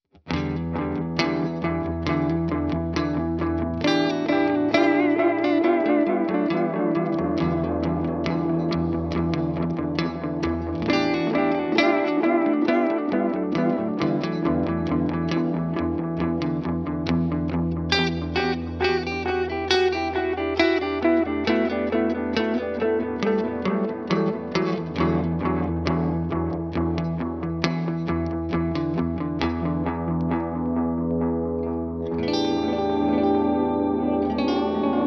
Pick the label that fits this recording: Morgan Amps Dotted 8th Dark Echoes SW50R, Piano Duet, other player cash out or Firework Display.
Morgan Amps Dotted 8th Dark Echoes SW50R